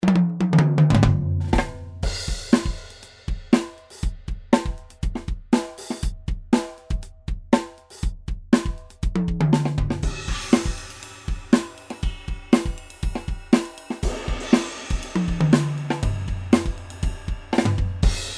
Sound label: rythm dry; drumod